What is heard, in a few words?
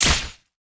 imp circle sword